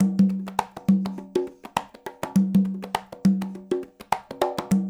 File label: LOOP, Percussion